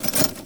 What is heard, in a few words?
Pickup Armour; Pickups